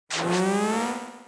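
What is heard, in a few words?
attack windup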